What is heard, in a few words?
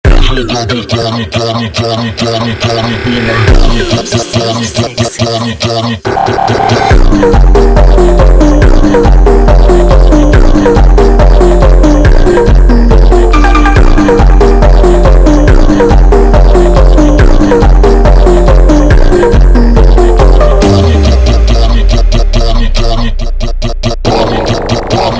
Funk super Sped Up